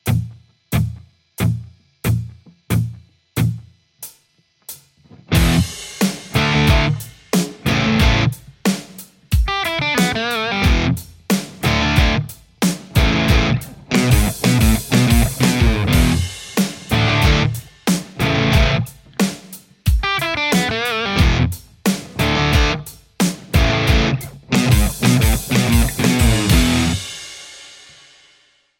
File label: lespaul; mercuriall